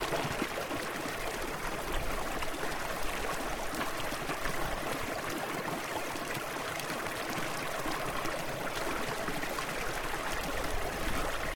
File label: water; voxelands